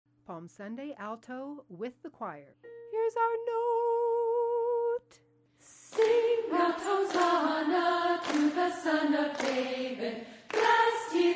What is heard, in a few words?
Palm Sunday, alto w choir